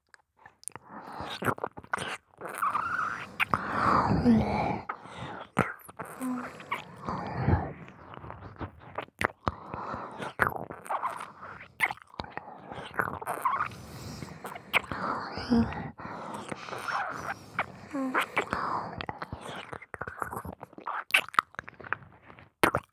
耳舐め

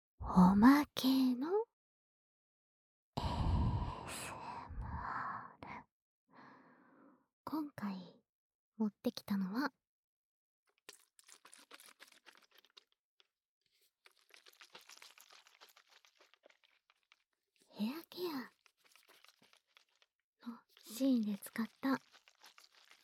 水のいらない泡シャンプー

